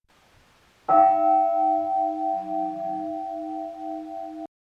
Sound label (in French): cloche; Arlanc, cloche de 1492